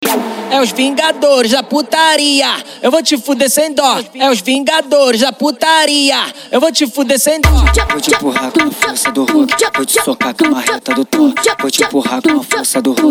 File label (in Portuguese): funk consciente e funk ritmado